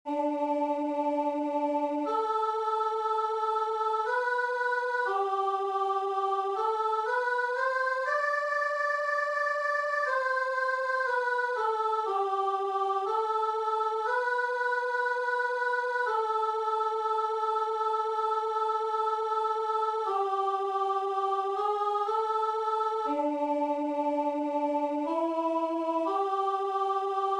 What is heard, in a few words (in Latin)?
Ave Maris Stella Choeur Sop; Monteverdi; Vespro